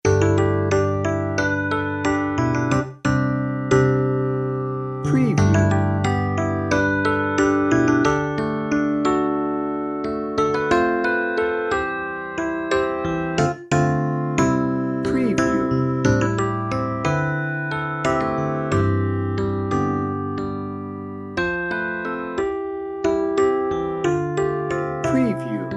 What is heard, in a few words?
mellow reflections piano only